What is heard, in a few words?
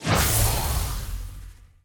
Energy Orb; Sci Fi Weapons Cyberpunk Arsenal